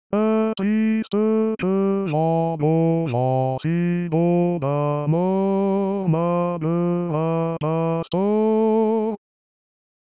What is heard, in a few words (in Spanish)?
ay triste que vengo basse